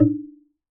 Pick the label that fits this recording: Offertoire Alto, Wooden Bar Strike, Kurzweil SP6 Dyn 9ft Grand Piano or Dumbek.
Wooden Bar Strike